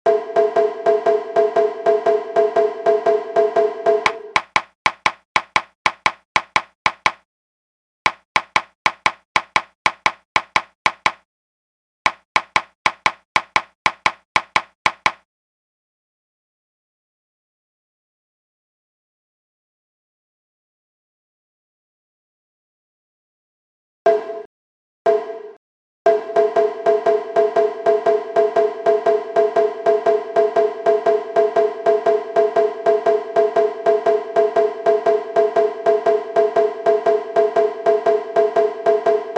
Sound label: hiryu sandan gaeshi shime 120bpm